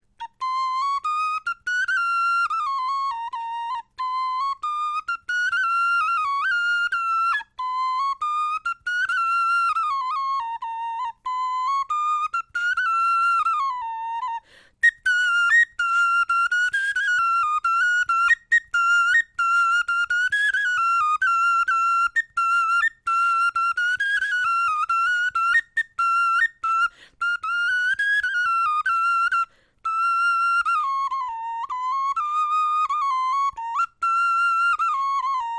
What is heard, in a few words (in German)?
melodie, knochenfloeten